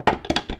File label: Gunrack Cabinet Unlock; Doors